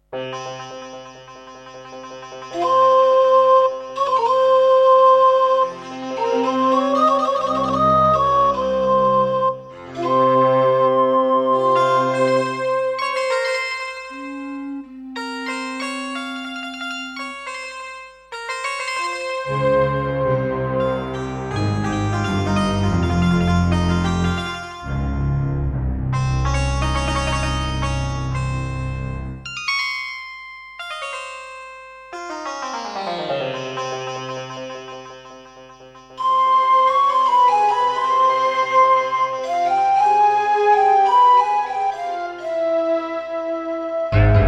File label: Intro, Roland SC-8820 55MAP; Prince of Persia 2 - Intro